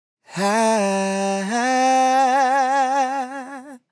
Ad Lib Dry, 95 BPM A Min, Vocal; Cymatics; Euphoria Vocal Sample Pack; Ad Libs

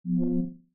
cursor selection; PremiumBeat SFX

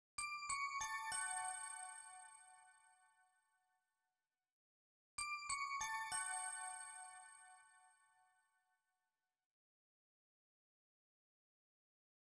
Bell, 96 BPM B Min; Loop Stems; West Coast; Cymatics; GHOST (Beta Pack); Forever

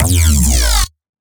Bass Growl, Dubstep; Bass One Shots; Bass X Growl2; Ghosthack; Dubstep Sound Arsenal; 50 Bass One Shots

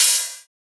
OH; Hats and Cymbals

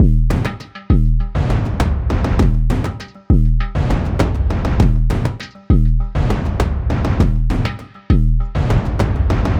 Percussion Loop 100 Swagger; Drums & Percs; Percussion Loops; Ghosthack

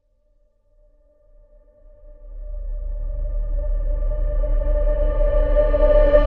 Riser

